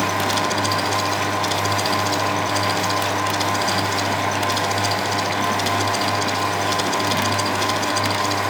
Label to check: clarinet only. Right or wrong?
Wrong